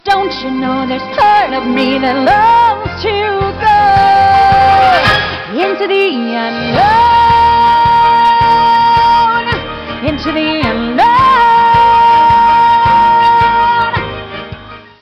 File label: SATB